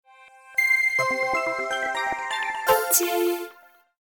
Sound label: Power On Sound; Samsung Galaxy U SHW-M130L; LGT